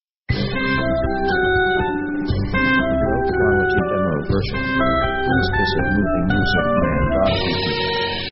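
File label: orchestra quirky comedy; Comedy themes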